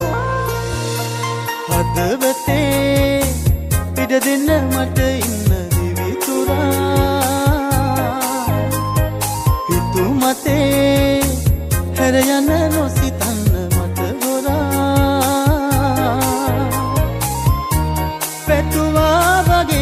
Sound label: sri lankan love song ringtone